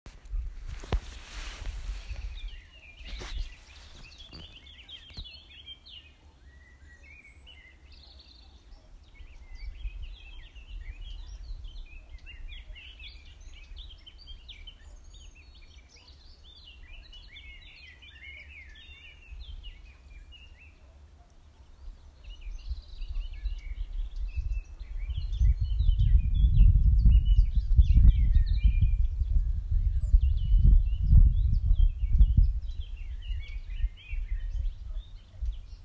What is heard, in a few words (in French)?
langage oiseaux